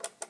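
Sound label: pause down; Mass storage; TS2020; zxsp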